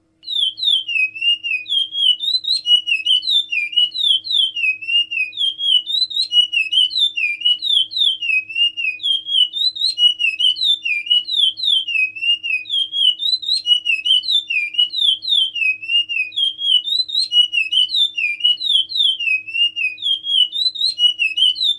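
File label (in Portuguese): timbira virado, Maranhão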